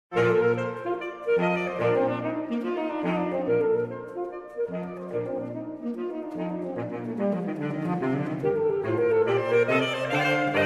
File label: Sax